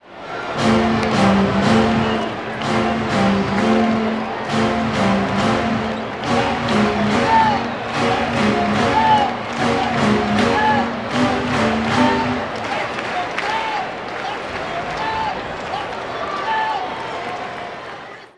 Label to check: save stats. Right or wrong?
Wrong